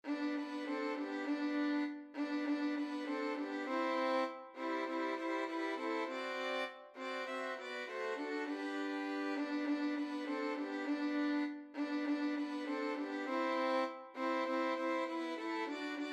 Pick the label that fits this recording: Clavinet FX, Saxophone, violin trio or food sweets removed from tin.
violin trio